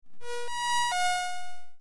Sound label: alert